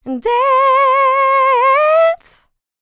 licks, vocal, dance; ILIO; Vocal Planet

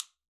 Steel Drum Sticks A4, Calypso Steel Drum; Percussion

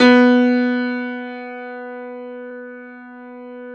PIA HIGH G, Grand

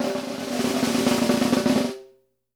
ROLL, snares; Sampleheads - New York City Drumworks VOL-1; SW SNARES

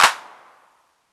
clap one shot mid classic, Classic Chicago House; Sample Magic; Drum Hits; claps

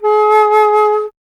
Alto Flute, Solo Instruments

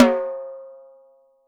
6X12 WOOD, drums; Living Drums

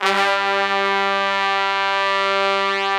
STRAIGHT G1, Section Horns

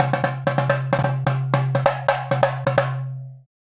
Indian Drum; Sonic Foundry (Sony Creative Software); Essential Percussion; Drums

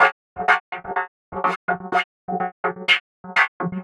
synth 125 resoscratch; Transmission-X

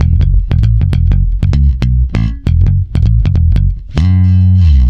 F#, swing mute, bass; Spectrasonics; Bass Legends

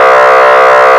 lead; Mono

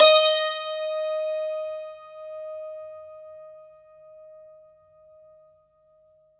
STR, SANTOOR; Best Service ProSamples vol.52 - World Instruments 2; AKAI

